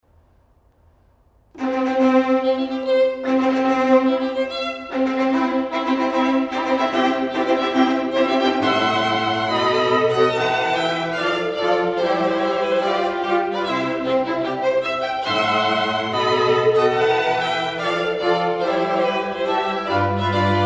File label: Wedding March Quartet